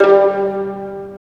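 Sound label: Violins Pizz; Roland L-CD702; Vls Pizz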